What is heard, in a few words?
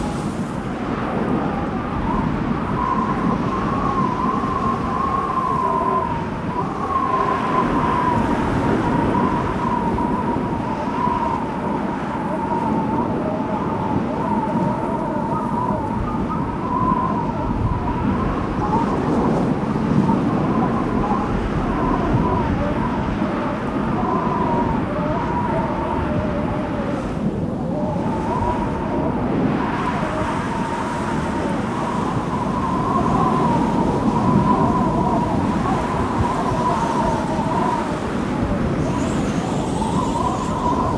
cold wind; firefight